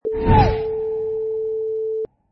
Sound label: Wipe Long Tail